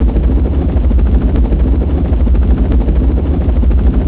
helicopter; tfc; kandahar

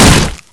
wood, debris

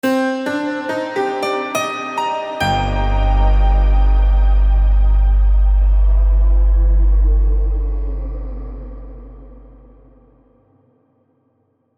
checkpoint jingle; hunger

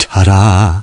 Cheer; svencoop; voice commands

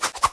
swoosh

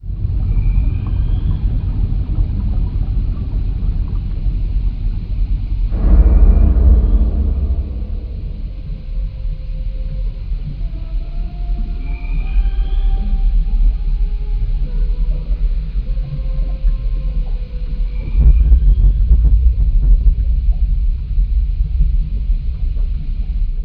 the ring; svencoop; raccoon

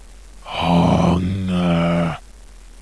hunger, zombie; brainbread

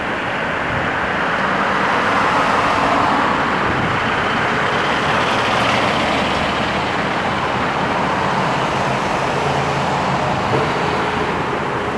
traffic; tfc

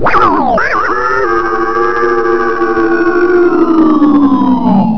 attack; cryocell

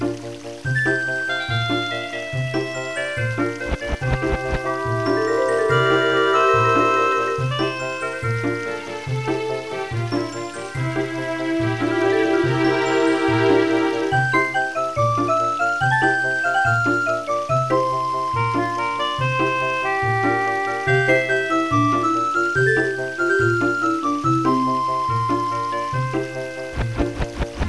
forest loop; tfc

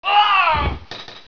bandit die simple; gearbox

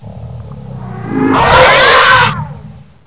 xenome call

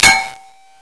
metal, debris

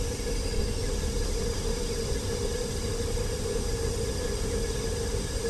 steam pipe; svencoop

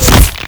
body hit; hl club

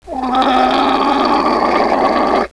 ooorgle; firearms

